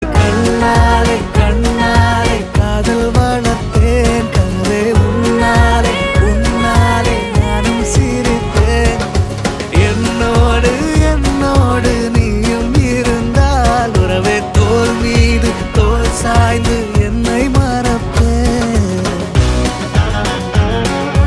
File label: Male Bgm Ringtone